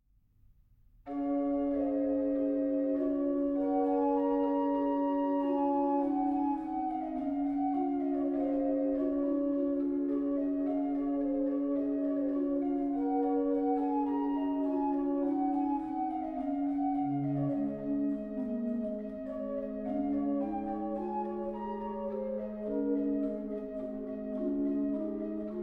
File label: Pastorale, Trem; waltershausen; BWV590